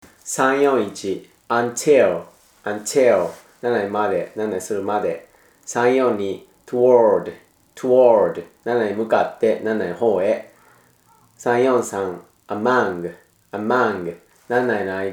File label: tango